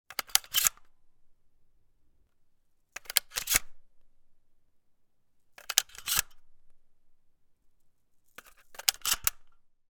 gun nova; still north media

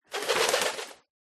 animals bird magpie fly away wings flap close up; zapsplat

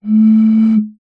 bottle beer empty blow air across top

